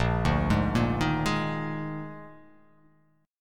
A sharp 11th

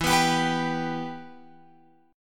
E-Minor, Guitar, Open F; strummed; JGuitar

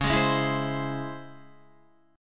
Db Minor Major 7th Db Guitar; DbmM7 strummed; JGuitar

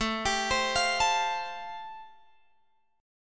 A Minor 7th Sharp 5th A, Guitar, Drop D

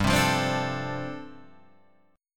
G 9th Suspended 4th, Guitar Drop D

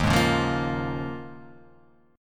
Eb 7th Suspended 2nd Suspended 4th Eb; strummed; chord

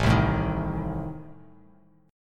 A Diminished Bass Standard; Adim strummed; JGuitar

